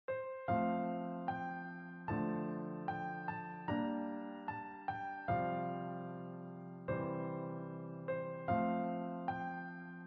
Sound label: EASY PIANO TUTORIAL; Synthly